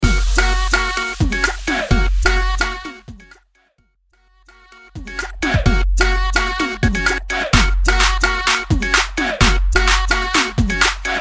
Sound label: Tribal Mix, 128 Bpm; Mainstream VIP Bootleg Vegas Edit